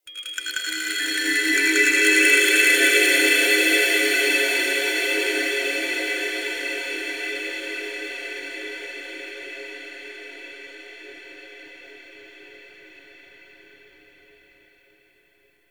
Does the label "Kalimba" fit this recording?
Yes